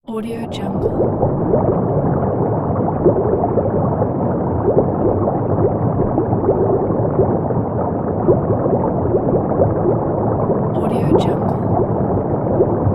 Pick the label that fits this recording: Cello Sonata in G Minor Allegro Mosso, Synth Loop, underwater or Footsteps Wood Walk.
underwater